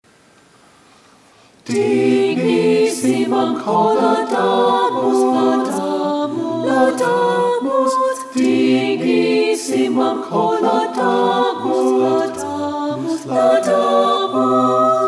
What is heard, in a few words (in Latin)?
Aufer a nobis pt 2 SATB